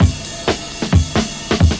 funky, drum loop

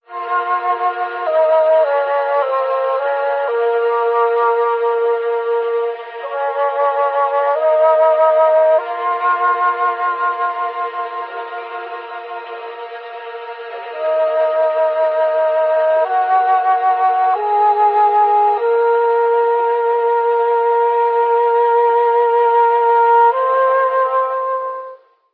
flute, Sad

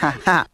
"ha ha", Voice

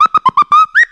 scratch beeps; Zaps and Beeps

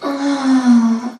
"oh", orgasm; ooh ahh

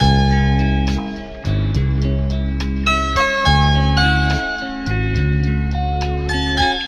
keys

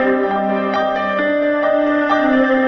piano, Sad Loops

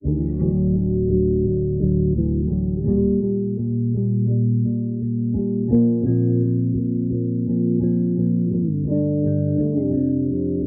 bass filter, Loops, Sad

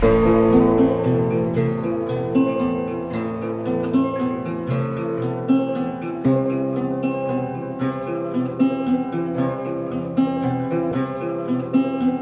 guitar, Jazzmatazz loops

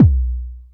Kicks Electro

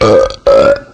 burp two short ones

